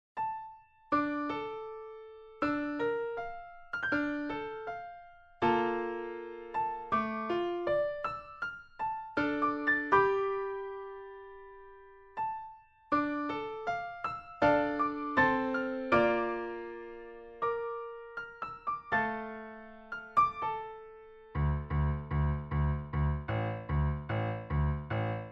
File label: Primo Fast